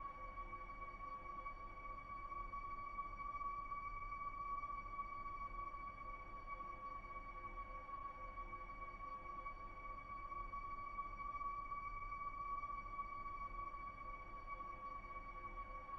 Sea Captain Room

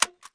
no bullets

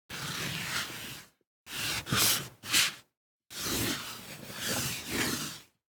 DICE on Felt, Slide, Standard, One Die; Samples; ArticulatedSounds; DICE Sfx Party (Extended)

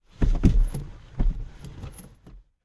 foley hit; driver; Blind Drive

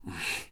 breath heavy in; Apotheorasis

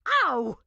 grandma short yell; Blind Drive

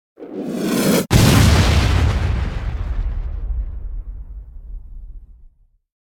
Explosion Heavy Pulsate Bomb Explosion; Hollywood Edge; sound designer's toolkit v2

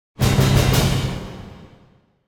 Symphonic Hit Short Triumphant Final; Hollywood Edge; sound designer's toolkit v2

